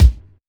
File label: thumpy steel kick drum c key; Duration 0:01; Samplerate 44100 Hz; Channels Mono